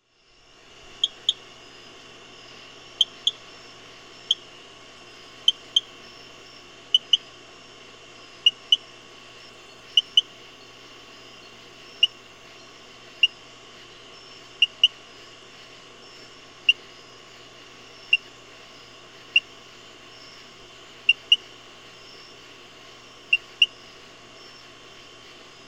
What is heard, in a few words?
Chirping Frog